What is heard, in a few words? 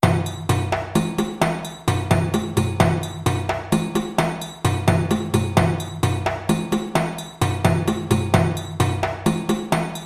Soli Rapid Groove 120 bpm, djembe